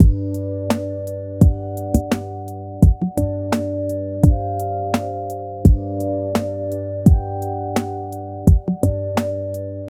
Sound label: chill lounge groove